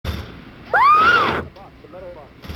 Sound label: kid scream